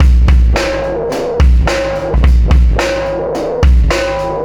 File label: System Overload Beat, Processed Drumkits